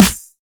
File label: short snare single hit b key